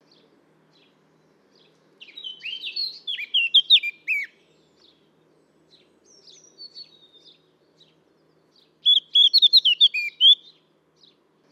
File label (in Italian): Capinera; valsolda